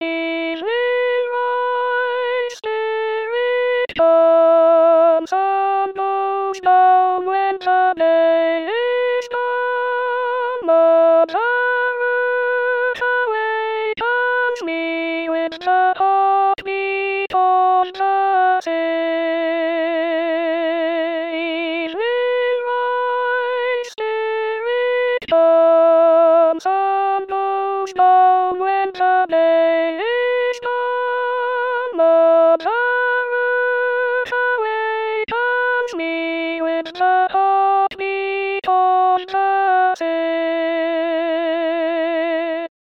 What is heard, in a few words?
evening rise melodie